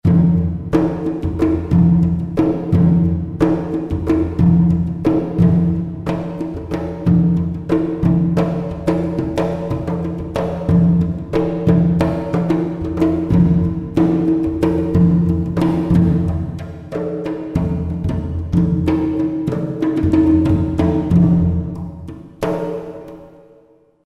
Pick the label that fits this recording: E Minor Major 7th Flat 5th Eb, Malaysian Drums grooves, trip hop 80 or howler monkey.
Malaysian Drums grooves